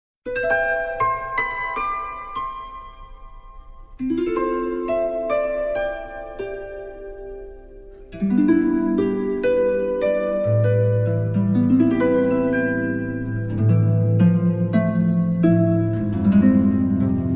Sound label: harp